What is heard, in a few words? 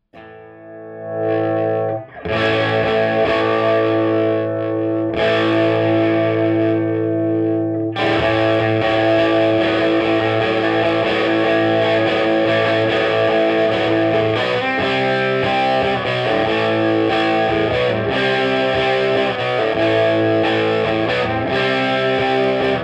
Piglet Clean